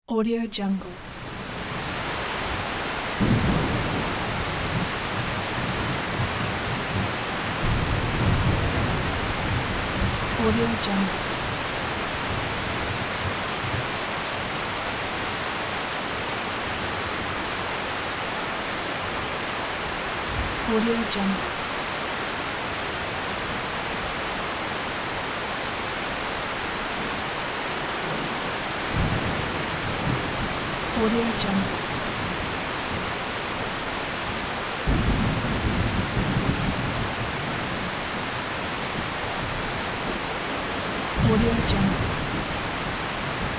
rain with thunder

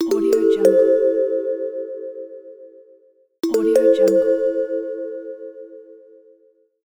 good idea; relaxingambience

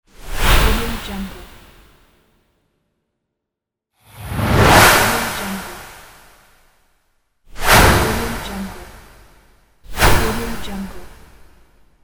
whoosh swoosh; danielmix

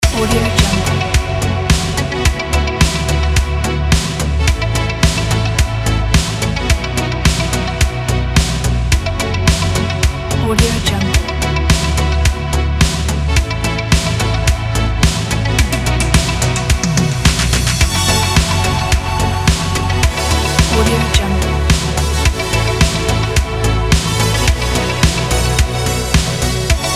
pop 80s loop

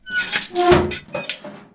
pain locker open close door; door source; soundmorph

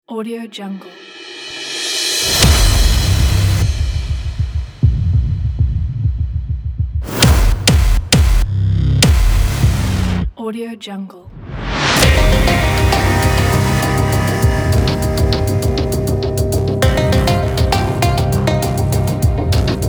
suspenseful

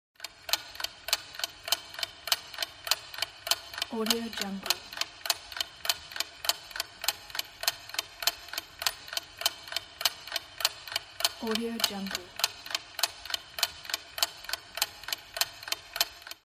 clock ticking

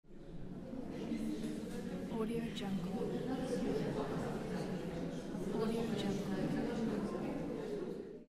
noise people in background

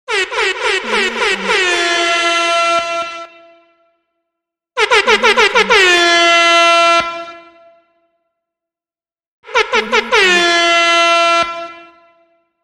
dj air horn

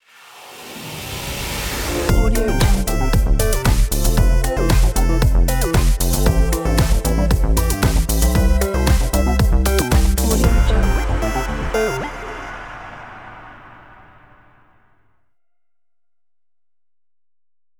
short logo

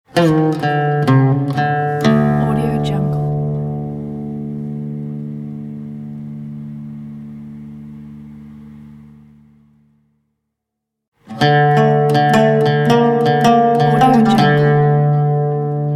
middle eastern oud logos pack

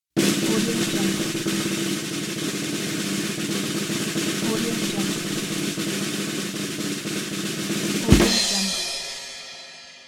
drumroll

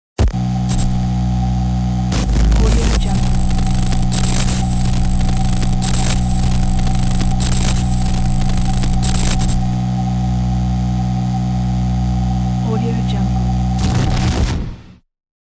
tv noise

